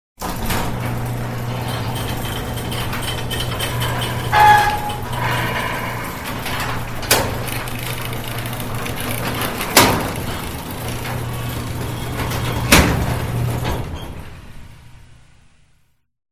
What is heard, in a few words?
heavy machinery; SOUND EFFECTS1